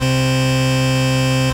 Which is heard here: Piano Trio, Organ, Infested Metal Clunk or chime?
Organ